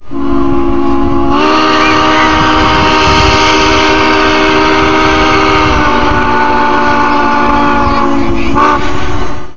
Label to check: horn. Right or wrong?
Right